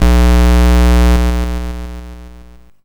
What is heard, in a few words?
YM Bass B, chip; ChipShop Deluxe; ChipShop Hits